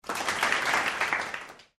short applause from medium studio audience

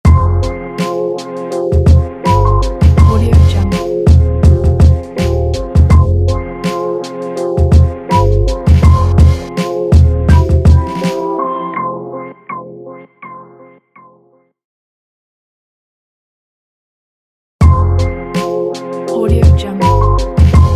dark horror hiphop logo

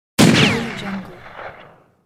rifle shot with ricochet

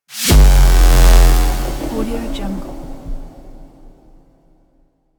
scifi synth hit; sinematic 2; soundmorph

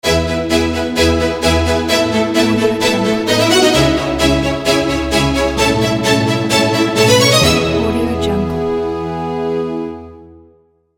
classical logo; red ninja

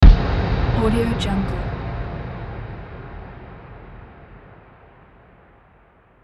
boom impact

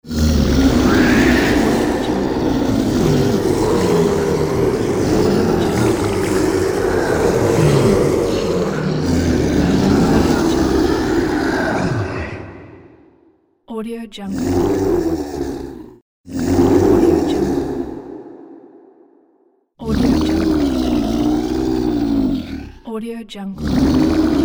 zombie

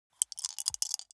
dice small three rattle in hand closed short; foley; smartsoundfx

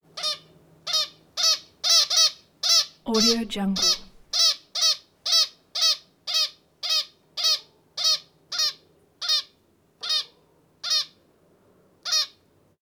finch chirps light metal bongs

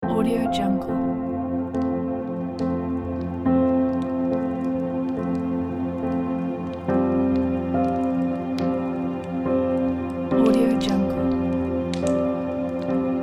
nature ambience music

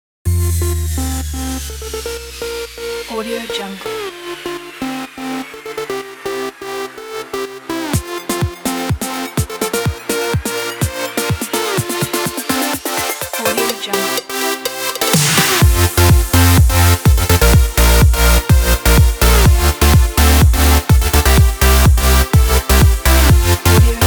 party sport dance

